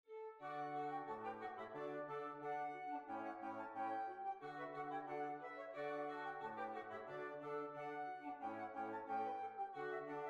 Lancashire Hornpipe

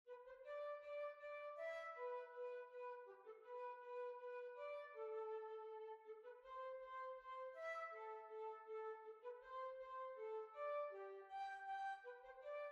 Polka

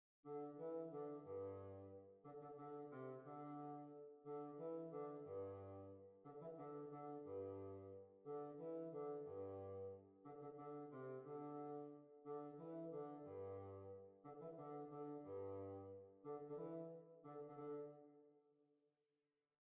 Waltz

